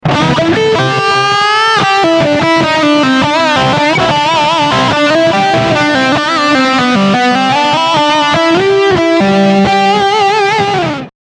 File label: marshall stack